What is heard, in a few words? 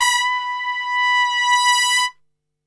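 B 2 trumpet; Best Service; Brass Super Section; TRP SWL; 90 s Sample CDs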